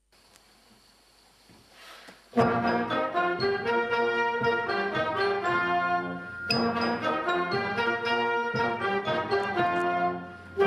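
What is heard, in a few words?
cheering song instrumental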